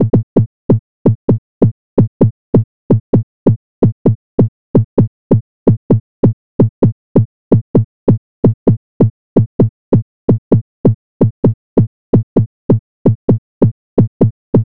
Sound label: Percussion, Afterhours EDM